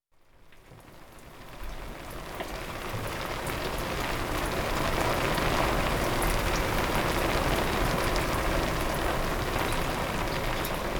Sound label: rain; Sound design for the opera composer: concepts and methods